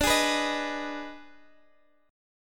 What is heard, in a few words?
D sharp Major 7th Suspended 4th Sharp 5th, Guitar